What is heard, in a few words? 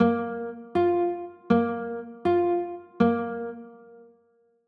b flat 9, chords